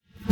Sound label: Physics Object Gym Ball Impact; Sx Physics-Object Gym-Ball Impact Rev